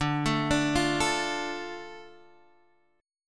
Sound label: D Minor D Guitar Half Step Down